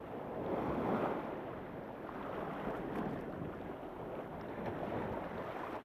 ambient port default; World Of Warships

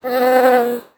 Amb Animals; Red Orchestra Ostfront 41-45

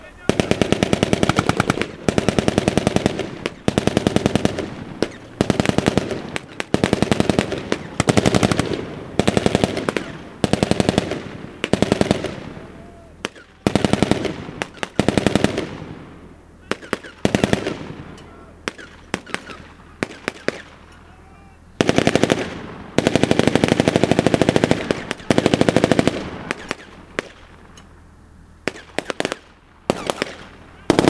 Amb Destruction; Red Orchestra 41-45

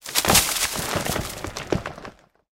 tank impact tree; tanks; War Thunder

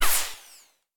bullet passby; War Thunder

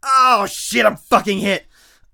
hurt medium; Escape from Tarkov

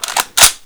Inf Weapons Foley 3rd; Red Orchestra 41-45

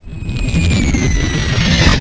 incoming

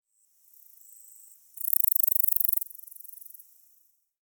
ambient; World of Tanks

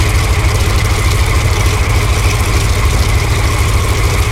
bearcat side, engines; War Thunder

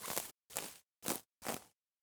eat; Rust

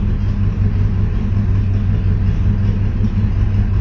t64a engine int rpm 00, tanks; engines; War Thunder

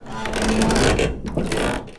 plane metall stress; War Thunder

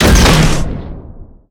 torpedo; World Of Warships; weapons

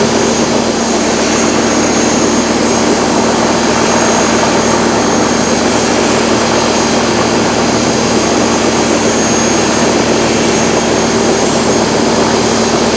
helicopter engine close no tail rotor; Rust

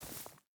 footstep burlap grass walk; Rust

